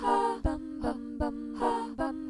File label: mixture, singing; MedleyVox; singing librispeech